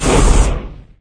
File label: Transform End; Fire Spirit; cookie run